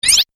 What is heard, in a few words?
Multimedia Rollover, mouseover sound